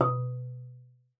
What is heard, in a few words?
MAR B2, MARIMBA; Xsample VOL-4